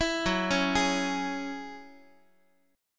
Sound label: F sharp 7th Suspended 2nd F sharp down Guitar; F#7sus2 arpeggiated